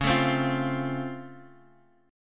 C sharp Augmented Major 7th, C sharp, down; strummed; C#+M7; JGuitar

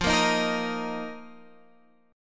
A sharp Suspended 4th F

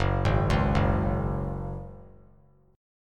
Gb Suspended 2nd, 3C,3F,3A,3D; Gbsus2 arpeggiated; 6,3,4,4

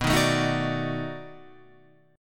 B 7th Sharp 9th, down, Guitar, Open F; strummed

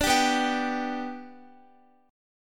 B-Minor Sharp 5th, Guitar; strummed

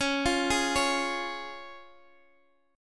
C sharp Major, Guitar, Open D

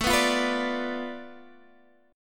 B Minor 7th Flat 5th A

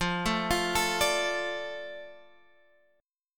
D Minor F, guitar

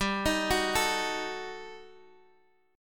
Eb Diminished G; Ebdim/G arpeggiated; JGuitar

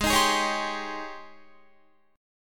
G sharp Major 11th G sharp, Guitar; JGuitar

